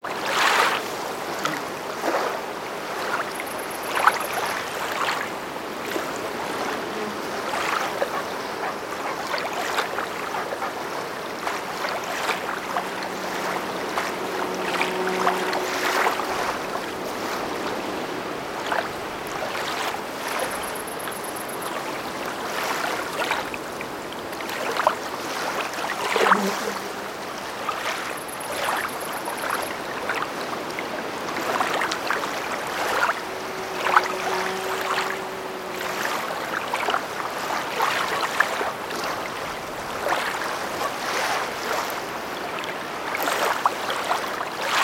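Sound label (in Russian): дневная атмосфера на озере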